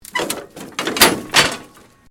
METAL CRASH; Materials

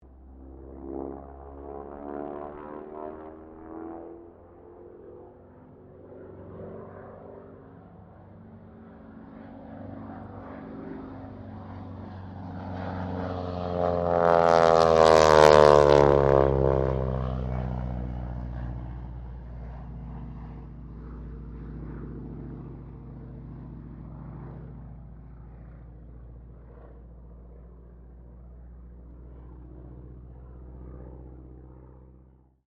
AIRCRAFT AT-6D HARVARD MK III PASS; Temora Harvard MK III; Airplanes; Vehicles; Airplane Sound Effects

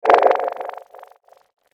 Whoosh Fx Air And Liquid; whoosh sound effect; swish, sweep and swoop

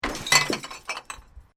Bottle Plink, Glass; Materials

